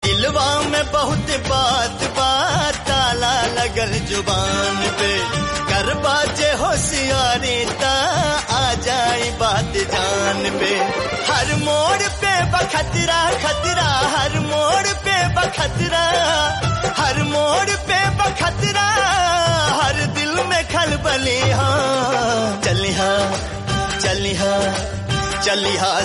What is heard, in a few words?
punjabi song